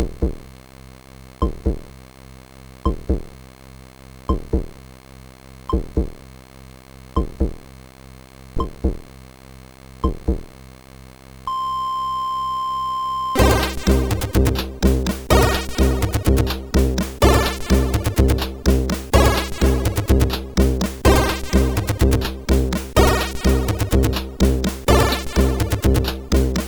Heartbeat, CSG8580R5; hvsc

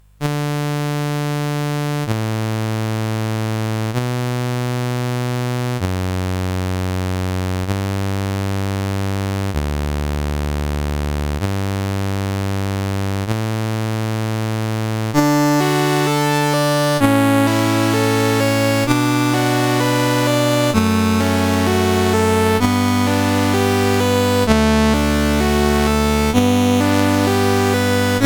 Canon in D, CSG8580R5

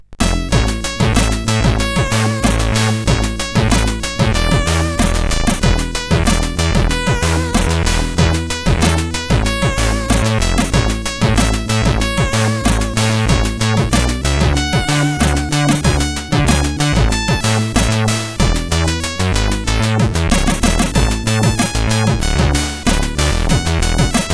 rap, sid CSG8580R5

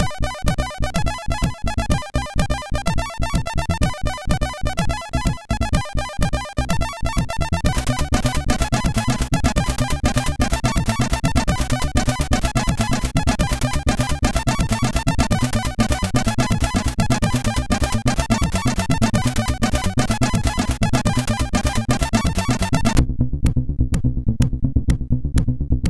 Hardcore, sid MOS6581

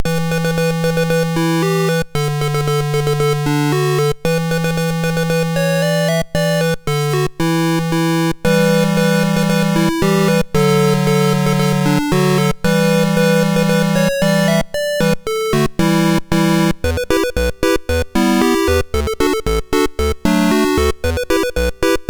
sid MOS6581R2; Treasure Island

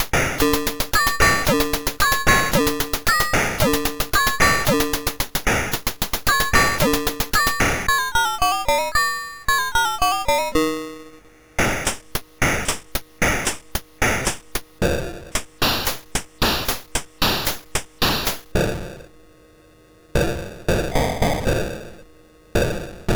Drone, MOS6581R2

